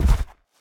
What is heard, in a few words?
footstep, manticore; smite